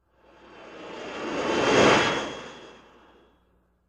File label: Viper Pass by fast; BSG